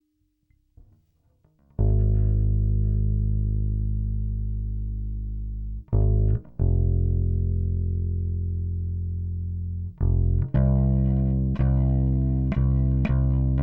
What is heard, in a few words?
bass no sc 50